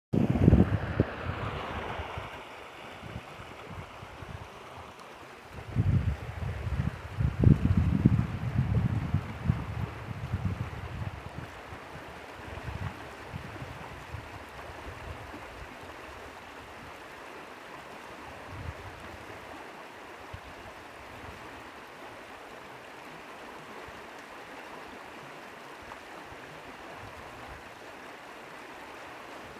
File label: falls sledding hill; berea